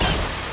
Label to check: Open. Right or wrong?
Wrong